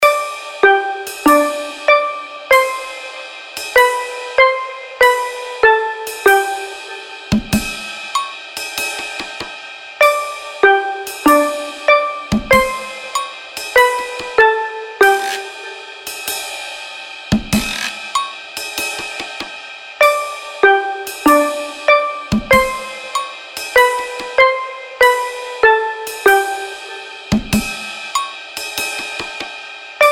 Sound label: acoustic; Seed of the Dead